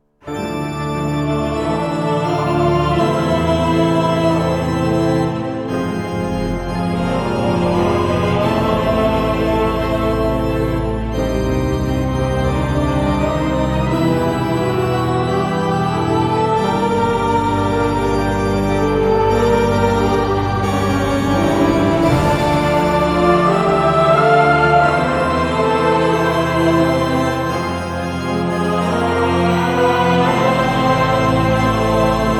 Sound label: Organ; Waycrest Manor